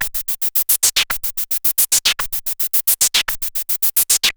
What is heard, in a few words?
MiniBrute 110BPM, Gated Seq Noise; noise hiss crackle